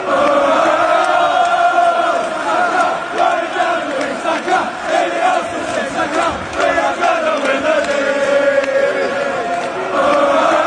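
arsenal chant running down the wing, football songs; saka; united kingdom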